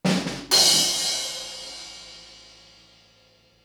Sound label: drumroll crash